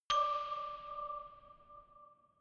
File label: notification glockenspiel creepy single low